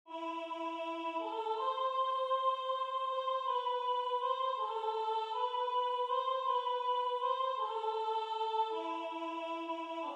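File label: Soprano, Religious Folk Songs Of the Negro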